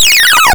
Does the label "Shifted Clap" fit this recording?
No